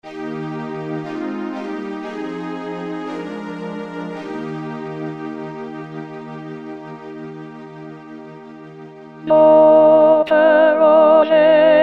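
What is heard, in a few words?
altos; Elgar; Coronation Ode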